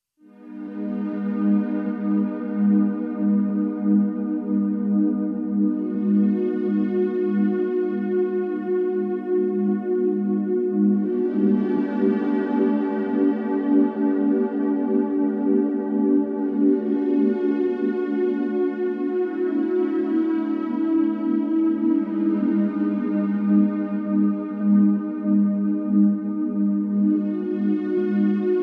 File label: spa music 432 Hz; orange heaven